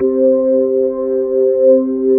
Mid Slow C4, B3; SoundFont - The Developer's Choice - Volume 1

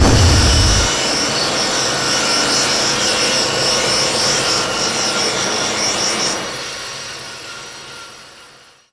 screech; PC Gamer IT CD 47; USAF; USAFDEMO

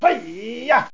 hieya

